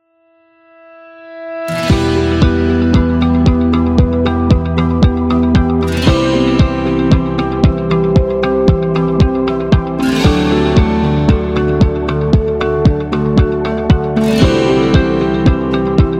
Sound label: Corporate Serious